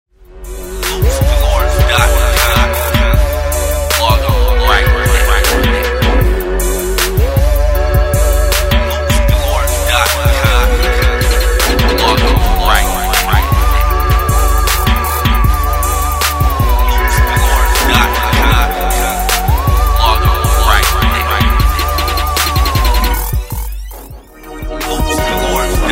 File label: Dirty South Loops